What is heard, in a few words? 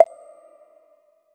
moon orb collection brigh